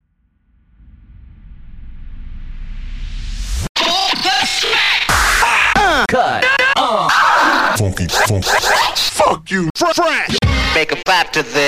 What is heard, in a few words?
Zombie Scratch